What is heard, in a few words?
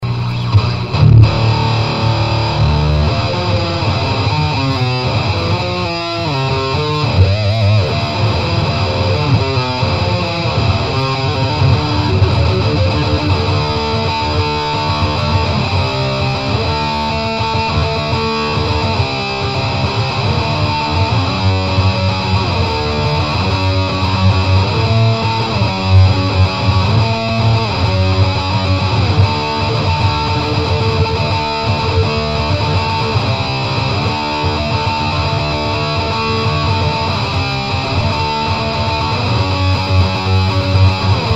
guitar